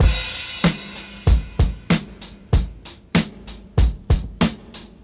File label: 95 bpm fresh drum groove A key, kick tuned to the A note; drum loop